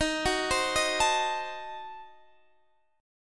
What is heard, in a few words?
C Diminished 7th Eb, chord